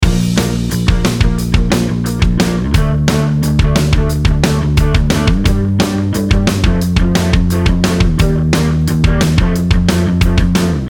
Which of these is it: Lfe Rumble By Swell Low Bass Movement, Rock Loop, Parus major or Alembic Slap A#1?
Rock Loop